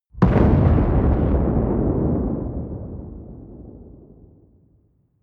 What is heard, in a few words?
missile large explode distant